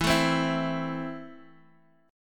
E Suspended 4th Sharp 5th, Guitar DADGAD; down

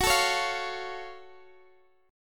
F sharp Minor Major 7th, Guitar, Open D; chordshape; down

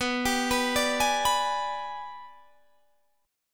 Ab Minor Major 7th Flat 5th

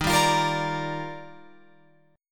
Eb Major 9th, Guitar Open F; EbM9 strummed; JGuitar

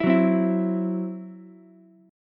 C Major Flat 5th, Ukulele; strummed

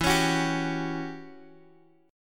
E Minor 6th Add 9th E down Guitar Open F; strummed; Em6add9

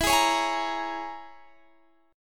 E 6th Flat 5th E

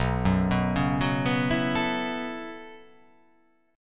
B 9th Suspended 4th B down

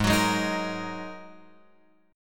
G sharp 7th Suspended 2nd G sharp Guitar Open F; G#7sus2; x,3,6,3,6,5; strummed

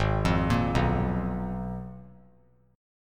G sharp Minor 6th Add 9th; G#m6add9 arpeggiated; 8,12,13,9; JGuitar

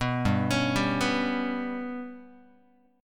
G Major Flat 5th Guitar, chord; GMb5 arpeggiated; JGuitar

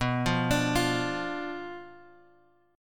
B Diminished B, guitar; Bdim Chord {X 2 3 X 3 1} arpeggiated

